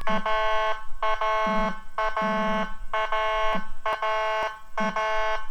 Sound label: PULSED AIR HORN & Howler